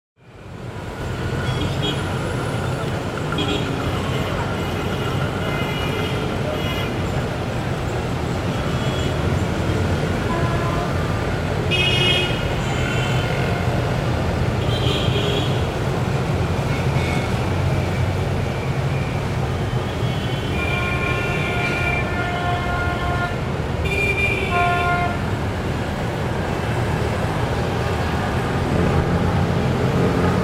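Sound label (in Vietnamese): Âm thanh tiếng xe cộ đường phố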